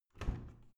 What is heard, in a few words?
washing machine modern open fast